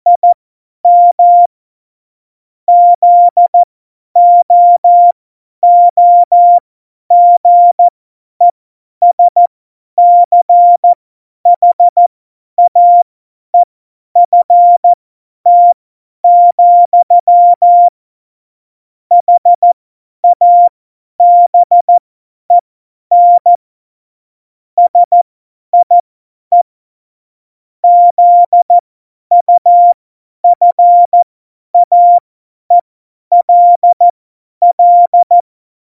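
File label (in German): Witz, cw morsen; Morsekurs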